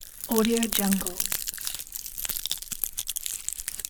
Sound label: ice cracking